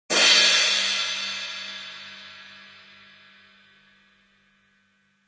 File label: Jazz crash cymbal cut to 180 bpm.; Jazz Cymbal Crash Loop 180 bpm 1; Drum Loops